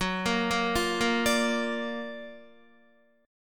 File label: Gb Augmented Major 7th Gb down Guitar 4F,4A,5C,5G,5C,6E; Gb+M7 arpeggiated; JGuitar